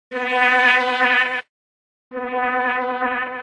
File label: buzzing insect